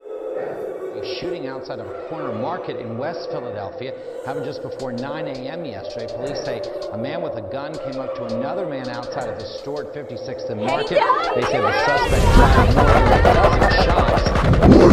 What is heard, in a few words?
Bells